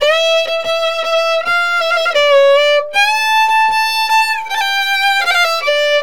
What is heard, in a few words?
VIOLIN; USB Soundscan vol.63; AKAI